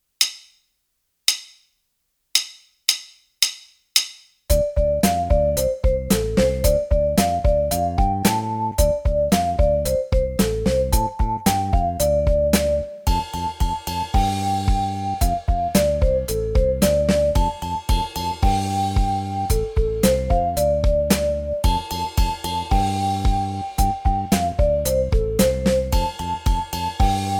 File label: Power Riffs Track